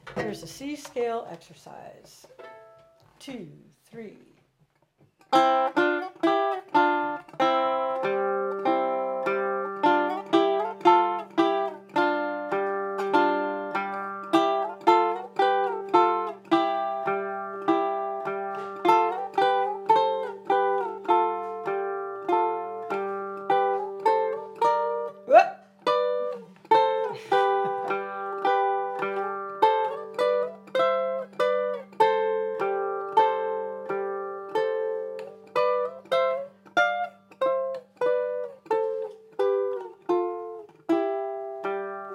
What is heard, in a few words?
c scale